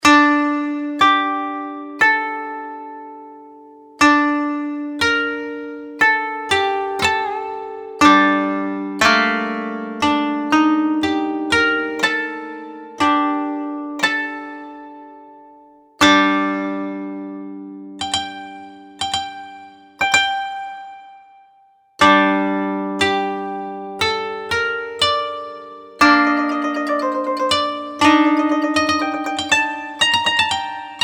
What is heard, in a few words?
koto